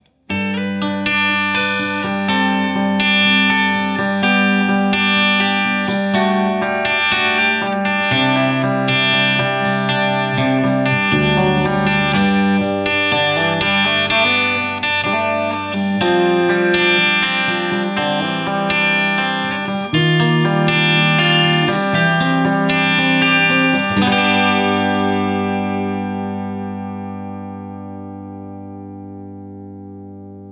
Big and Bright Clean

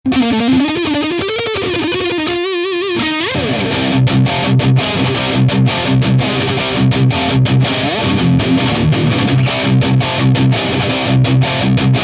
TH100 PPC412C Dirty Ibanez ART500E

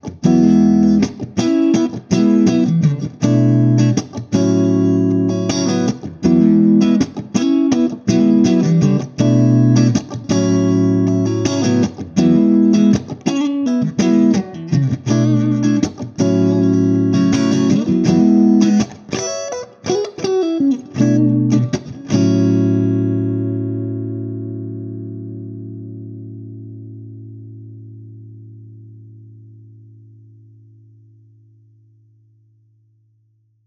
Strat Punchy Clean

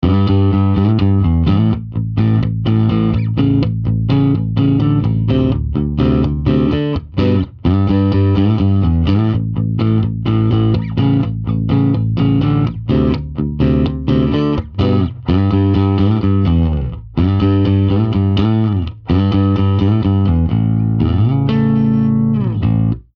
Pick Fender P Bass

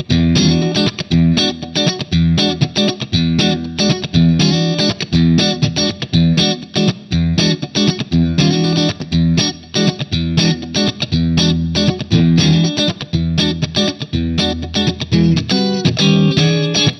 Sustain Funk Clean Amp Vintage Pedal

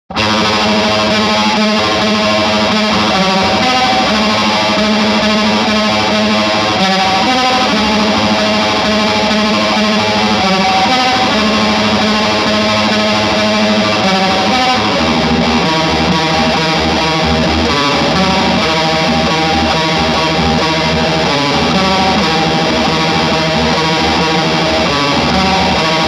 Phaser Fast Dirty Amp Vintage Pedal

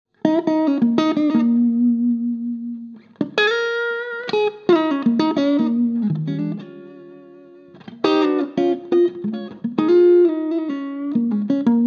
Crush Jazz Blues Lead Reverb

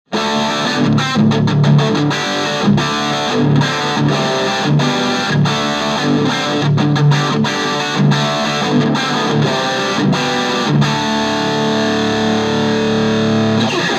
Crush Vintage Rhythm

